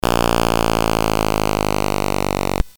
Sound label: BASIC sid CSG8580R5, GAMES; Terrible Twins